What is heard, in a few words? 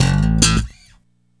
BASS SLAP; Stereo Guitars; Hip Hop; E-MU Formula 4000 Series Vol. 1; Hip Hop Nation